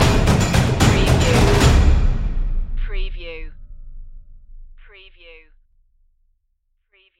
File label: dramatic percussion stinger